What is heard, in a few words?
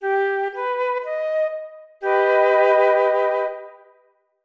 treble triad aug G